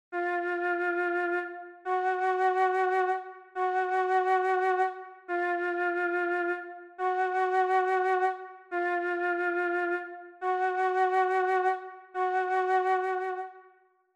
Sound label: ear training